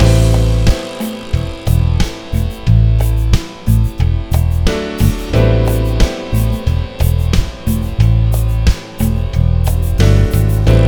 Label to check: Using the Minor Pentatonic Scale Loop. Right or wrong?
Right